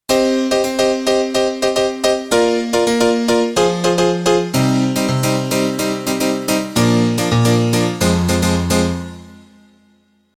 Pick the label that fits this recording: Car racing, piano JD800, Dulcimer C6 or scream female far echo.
piano JD800